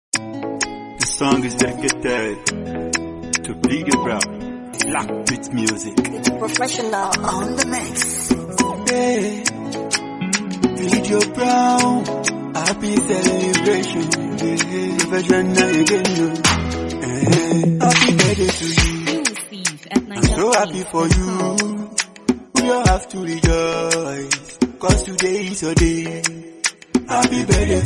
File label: Beat Happy Birthday